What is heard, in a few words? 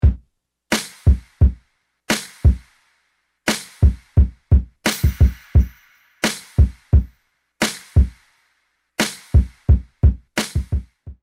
drums; Hip Hop /R&B instrumental